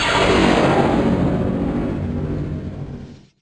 POW; XXL 800 Production Effects

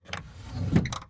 Dresser Drawer Open; Doors & Drawers; 1001 Sound Effects

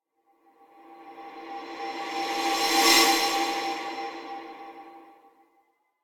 Impact Metallic Lower Moaning Impact - Passing; Hollywood Edge; sound designer's toolkit v2

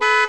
Prowler Horn, Automobiles

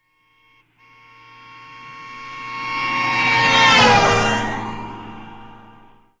car horn; Blind Drive

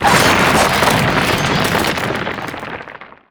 Crack Wood Spikes Emerge; SFX Kit; Foley - Misc

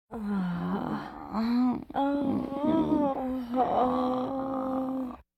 Vocal effects breathing Moaning Baby

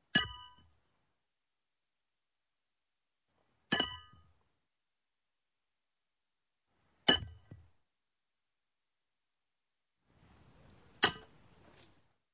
Horseshoe Pit; Sound Ideas 2000 Series

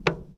Plane P40 Step On Wing; Airplanes

